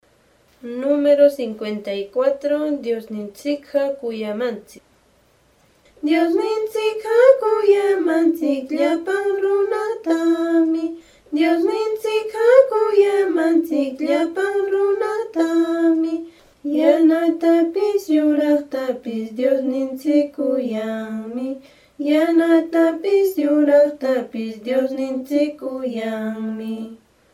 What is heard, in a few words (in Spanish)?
cancion